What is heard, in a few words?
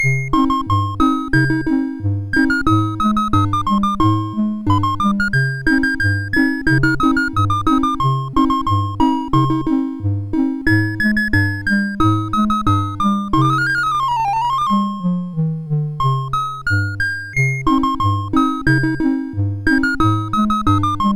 sid MOS6581R4, GAMES; Accolades Comics